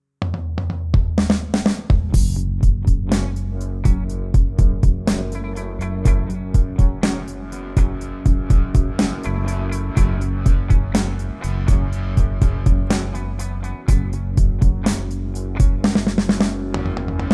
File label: Karaoke